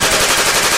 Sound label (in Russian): zvuk pulemetnoj ocheredi